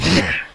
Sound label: monster hit; Original TK Sounds